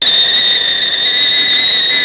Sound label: hi engine temp